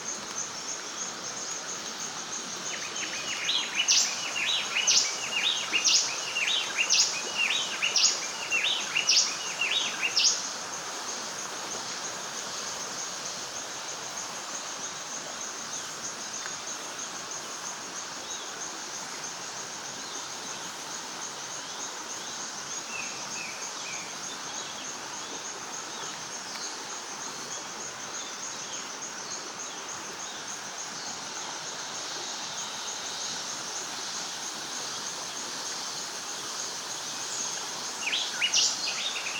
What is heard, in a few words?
Bay Wren Cantorchilus nigricapillus; tropical birdsong